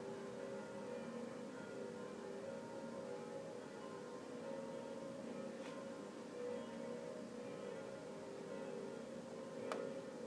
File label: church bells through open window sunday morning in oslo